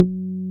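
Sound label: Pluck Bass; Best Service Gigapack 2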